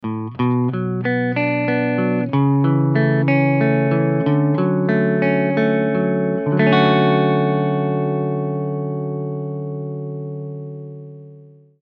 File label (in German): Gitarre unprocessed